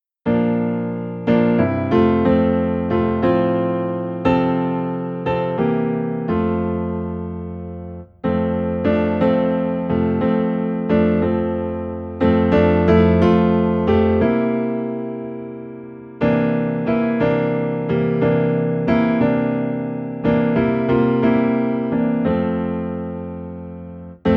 Piano Low in F

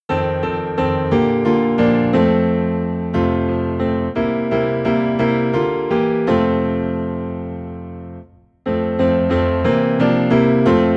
Piano Low in F; There Shall Be Showers of Blessing

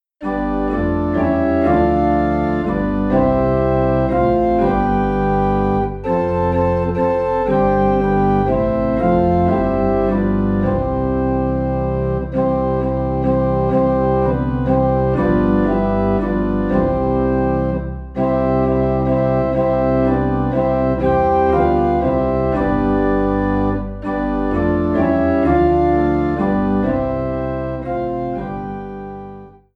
Organ Low in C; Sun of my Soul

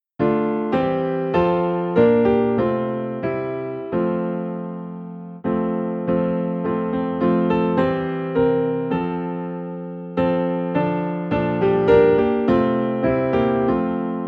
Piano in F

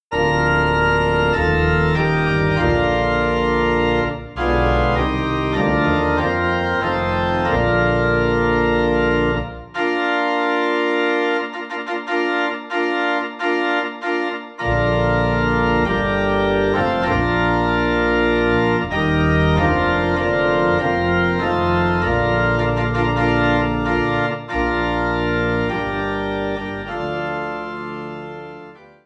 Organ Low in B Flat; God of our Fathers

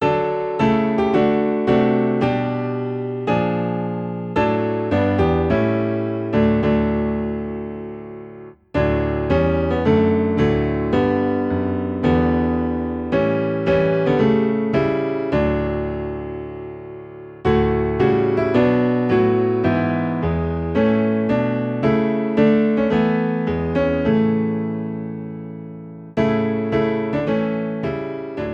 By the Sea of Crystal, Piano Low in D

